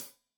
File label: Hat Tight, Hi-Hat, Cymbals; Deeply Sampled Drums; Stereo